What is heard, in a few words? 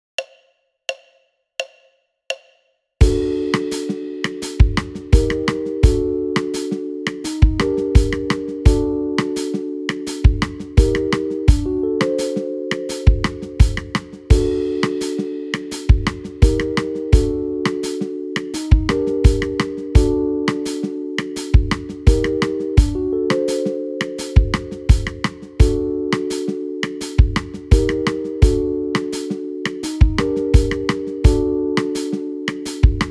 Piano